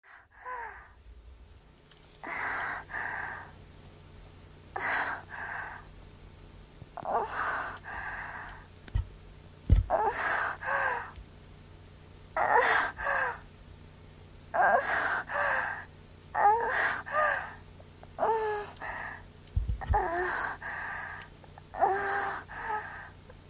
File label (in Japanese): アナルオナニーの快感を覚えてしまった美少女の喘ぎ声